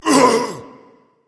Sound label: ouch berserker; cuchulainn